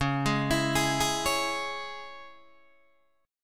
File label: C sharp Minor C sharp Guitar DADGAD, chord